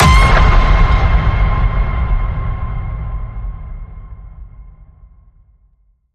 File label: Ping It Loud, C